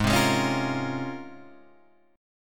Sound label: C sharp Major 13th, chord